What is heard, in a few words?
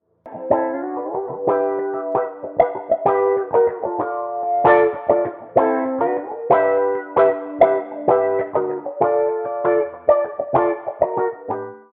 Funky